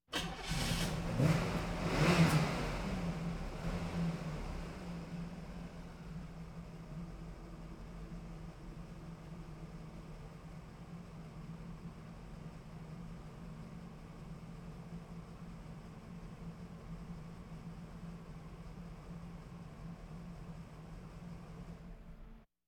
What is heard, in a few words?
Automobiles various